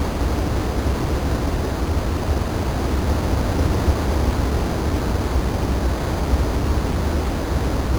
room tone; arcade ambience

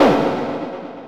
hit, sfx; anvil

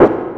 step, sfx; rust

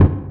hit, sfx; timber

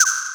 ui click; prism

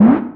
ui open; timber

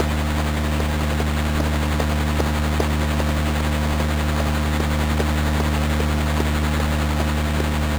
machine, arcade ambience

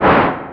whoosh; timber

